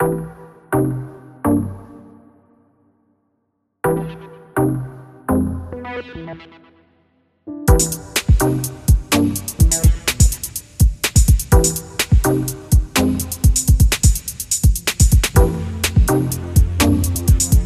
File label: annoyed, bgm